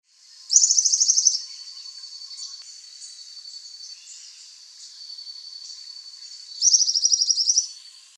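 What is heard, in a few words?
Pogonotriccus eximius; Ybyturuzu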